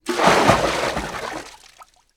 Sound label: miss; RS Games Sounds; Battleship